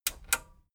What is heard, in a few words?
metal button radio click disable slow